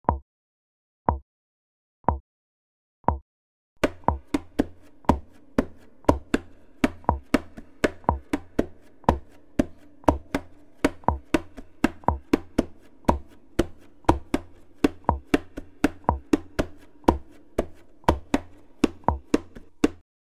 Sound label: hand to hand percussion